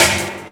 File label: SD, Drum & Bass; Ueberschall; Drum & Bass Resonance